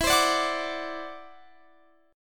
E 7th Suspended 2nd Sharp 5th, E, down, Guitar, Standard; E7sus2#5; strummed